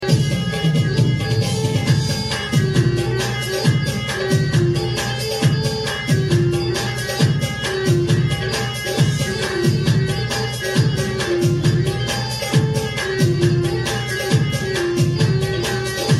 Lianic, ساز

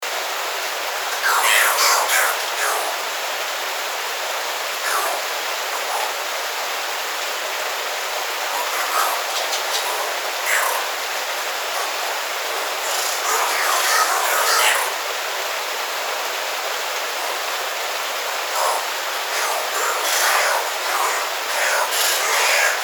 Oilbird

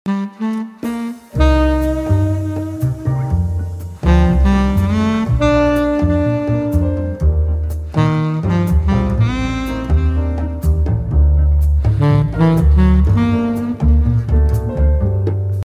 Jazz music, بی کلام

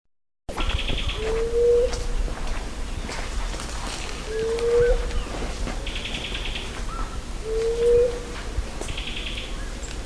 Blue headed quail dove; قمری بلدرچینی سرآبی

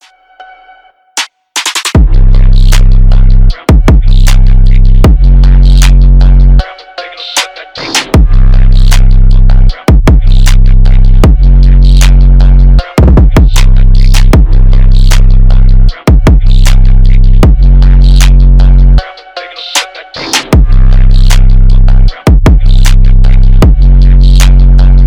amogus phonk

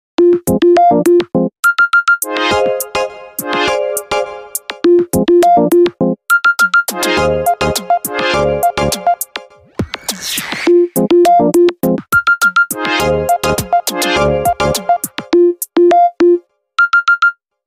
Funk Ringtone

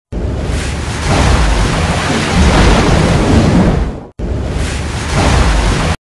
flood

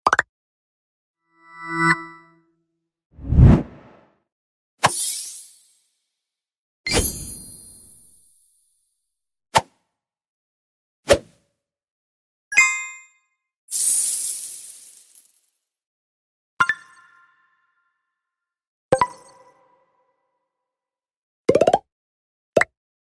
grow

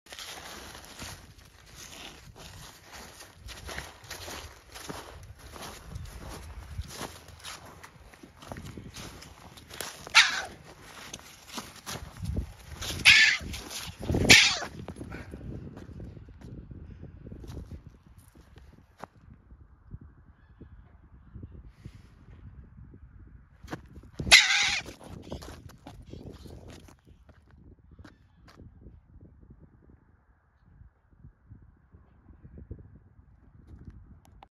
European pine marten; سمور جنگلی

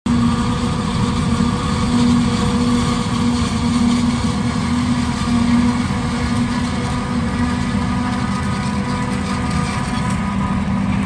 Combine

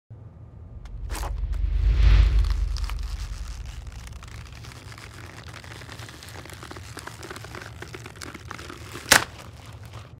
sticky; چسبناک

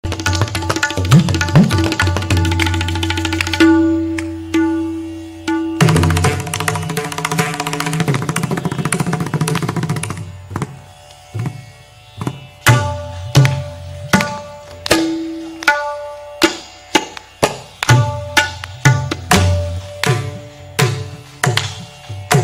Indian drums; کوبه های هندی

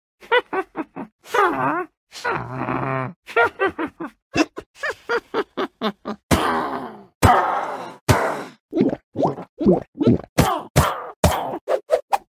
witch minecraft

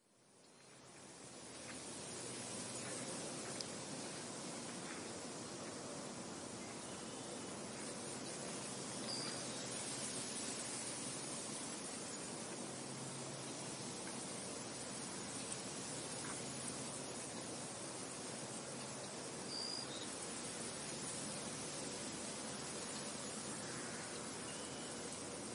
granary

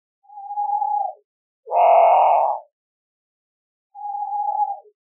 nyctibius

